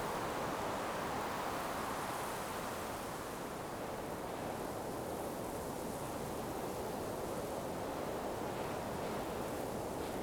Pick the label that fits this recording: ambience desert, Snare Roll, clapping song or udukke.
ambience desert